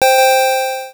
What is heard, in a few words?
Coin up; arcade; FIFjr